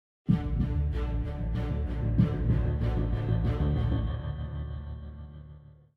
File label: 190bpm; Legends of Pegasus; windows gamerip 2012